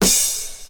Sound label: soft sounding open hi hat sample e key